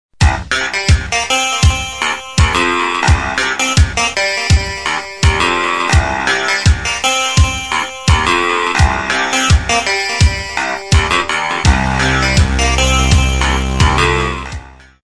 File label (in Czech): jazzrockovy budik